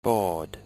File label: baud